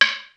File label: Stick, Korg T3; Drum Samples